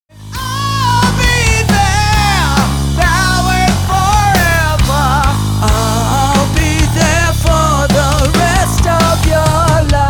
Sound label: Backing Track